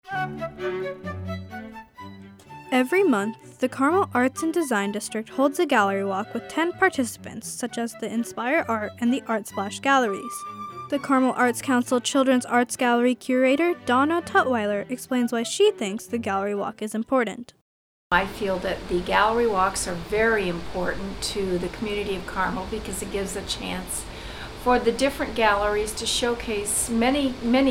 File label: BEAT